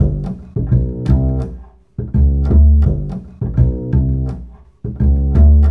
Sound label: Acoustic Bass